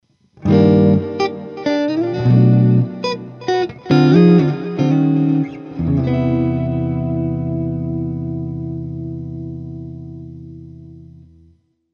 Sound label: echo; Tape Echo; Guitar Rig 3; 周边效果器; 模仿经典效果器的怀旧之作